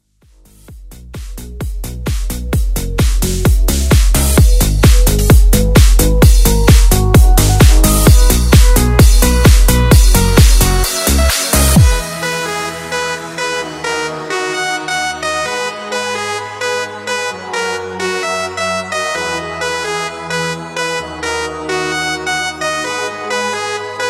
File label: Reboot 130 Bpm